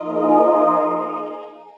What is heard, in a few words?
regen